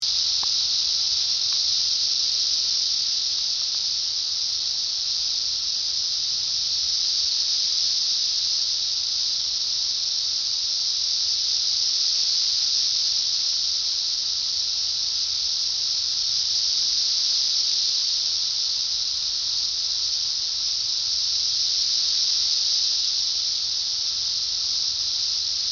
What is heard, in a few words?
Cicadas Sing